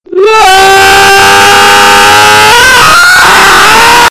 new weapons scream

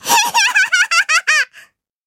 laugh

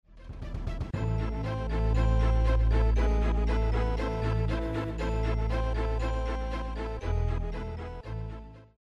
map music; donkey kong 2

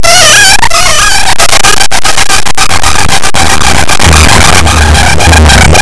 chicken charge

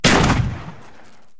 spas 12 sound effects

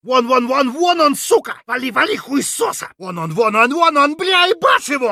russian yell; eft

